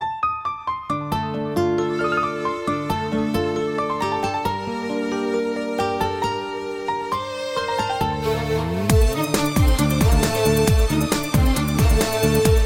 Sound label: Accordion